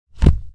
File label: Hit